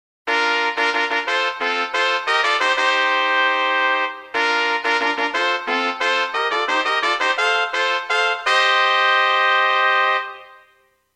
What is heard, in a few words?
fanfale